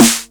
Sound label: Snare; VEC1; Club